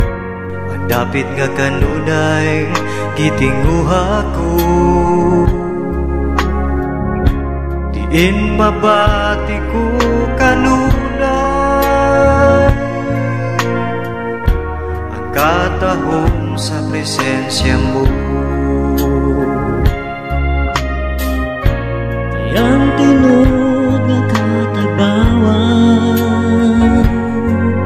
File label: christian, christian gospel